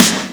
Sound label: snare; Breaks N Beats Sound Kit; Beatz Kit